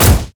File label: rumble basic attack